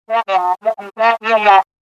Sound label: wah wah